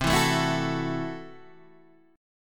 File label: C 6th Flat 5th, C, down, Guitar, DADGAD